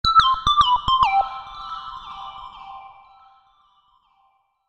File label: Melodic Notification